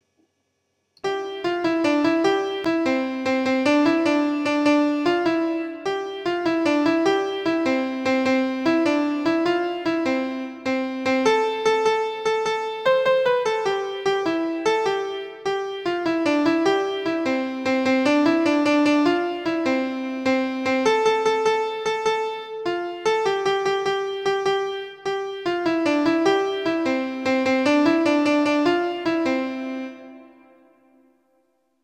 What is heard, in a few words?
Stringybark Cockatoo 100bpm, Fireside Fiddlers